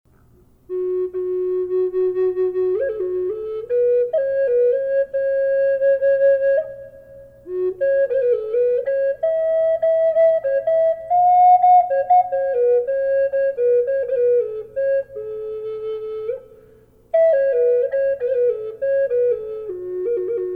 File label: cockatoo reverb, flutes; collector flutes